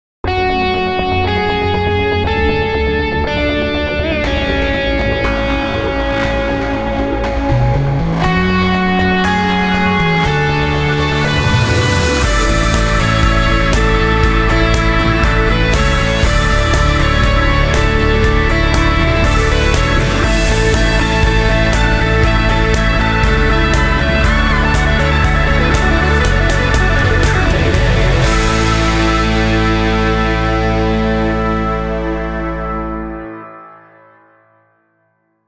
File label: Org V2 Rock Mix